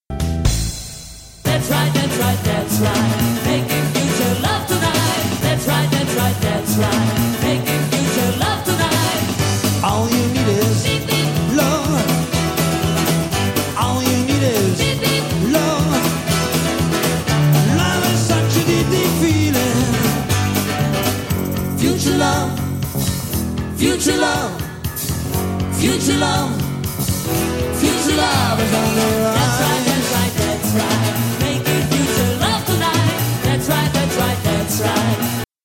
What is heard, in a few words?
jingle